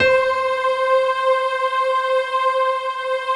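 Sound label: C 4, PIANO CHOIR; Best Service; Advanced Media Trax Modern Composer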